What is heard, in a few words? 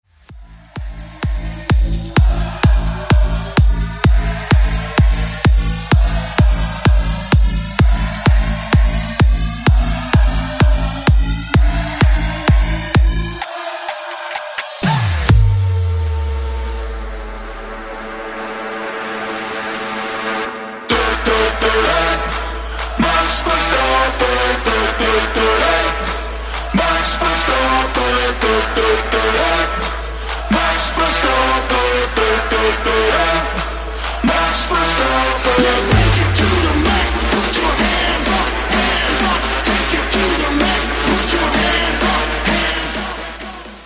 EDM Banger